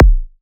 Kick B, Psy Kick; Drums; Ghosthack; Ghosthack Advent Calendar 2017 Day 9 - Psy Kicks; Ghosthack 20 Free Psy Kick

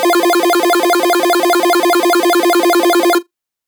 Lead Shot F, Dubstep; One Shots; Mario; Ghosthack; Dubstep Sound Arsenal

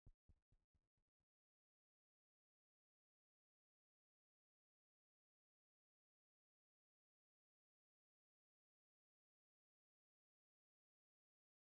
Glock 132 BPM C# Min Percussion; Trap; Loop Stems

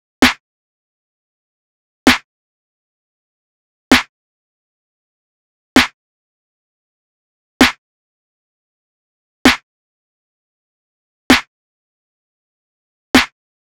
Drum Loop, 130 BPM Snare; Loop Stems; Cymatics; DOPE DRUMS VOL. 1; Hunted

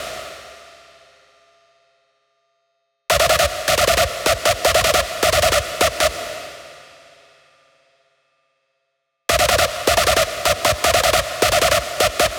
Screech E 155BPM, Rave; Techno; Melody Kits; Stems; Ghosthack; 7 Free Rave Melody Kits 2025; Kit Ritual

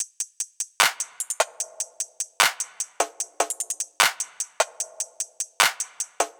Top Loop - 150 BPM, Drum Loops; Cymatics; Cobra Hip Hop Sample Pack

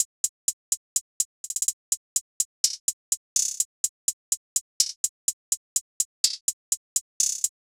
Hihat Loop 125 BPM; Tantra; Cymatics; Fiji Hihat Loops & Percussion

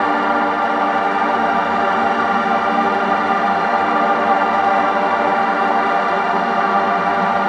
Atmospheric Loop Gmin 128, Melodic Techno; Devote; Ghosthack; AC2024 - Day 12 - Melodic Techno

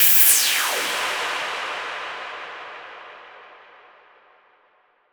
Fx 130, Techno; Loops; Ghosthack; Free Techno Sounds 2024

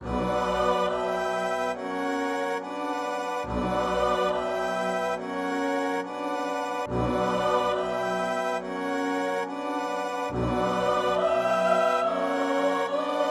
140 BPM B Min, Drill; Sacrifice

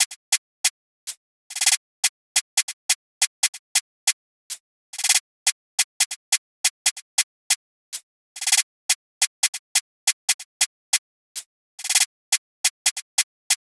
Grime Perc Loop - 140 BPM; Cymatics; Fiji Hihat Loops & Percussion

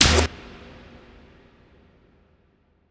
impact electronics; Hits, Impacts

